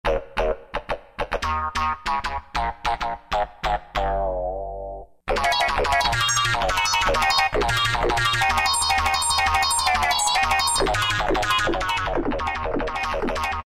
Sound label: Arpeggiate, Korg N364; Factory Prog demos